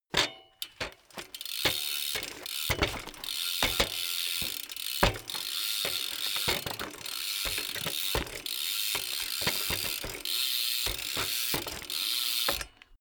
bmx bike pedal grip freewheel sequence

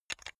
chess piece handle

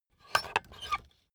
puncher squeak punch slow

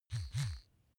razor smooth double

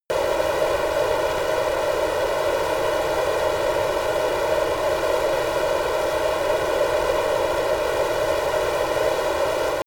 military jet f-22 raptor turbine idle close

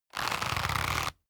leather creak short medium

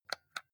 console controller xbox xyab press soft

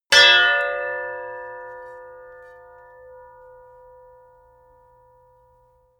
sword hit sweetener

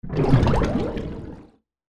liquid dry

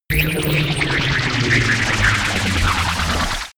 shuttle landing

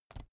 keyboard laptop space release soft

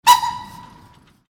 train horn old

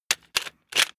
plastic sundries handling

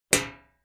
club hit sheet ring hard

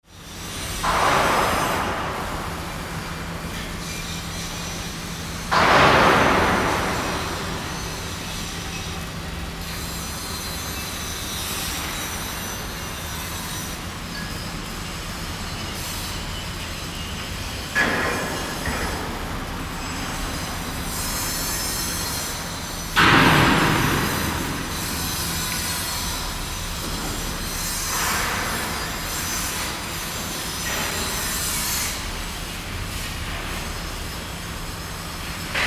shipyard hanger

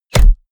bow hit generic

